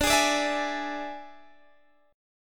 D Suspended 2nd Flat 5th D; strummed; 22,23,24,21,21,x

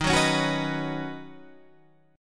D sharp Minor 7th Sharp 5th, Guitar, Open G

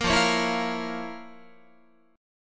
F Major 7th Suspended 4th Sharp 5th F, Guitar; strummed

